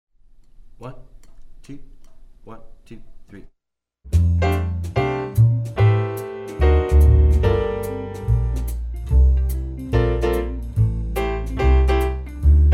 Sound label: swing etude rhythm section drums muted